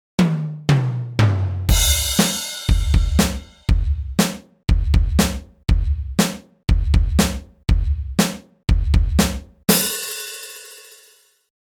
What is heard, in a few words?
drum loop